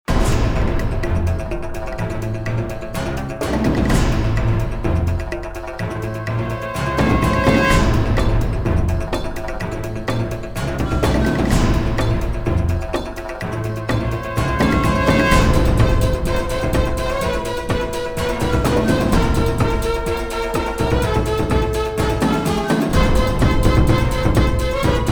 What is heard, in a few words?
asian intense; Xiaolin Showdown; XBOX